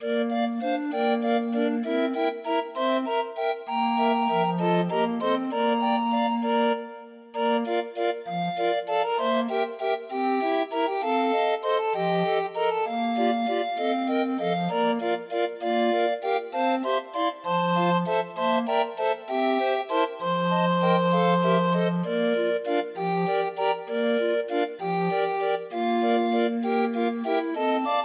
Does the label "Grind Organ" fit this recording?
Yes